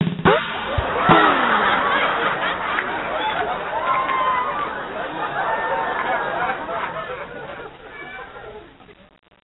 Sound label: Jingle Joke; Neighbours from Hell; NDS